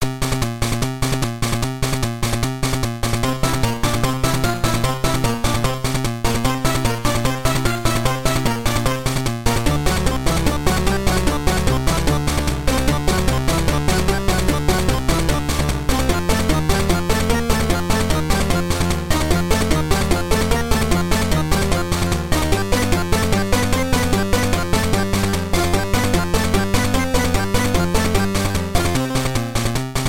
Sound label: Tick Tock Clock; Asterix Search for Dogmatix; Level 4